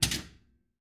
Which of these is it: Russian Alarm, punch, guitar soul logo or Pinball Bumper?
Pinball Bumper